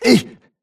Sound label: grunt hits; Titanfall 2